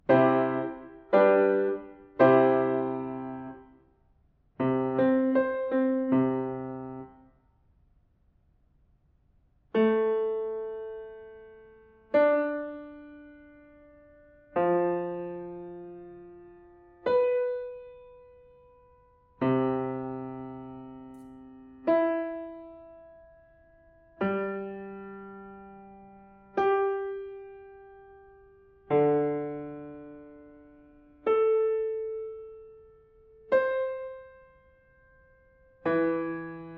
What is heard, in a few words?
diatonic collections